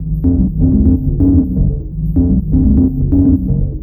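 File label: Synth Loop 125 Fm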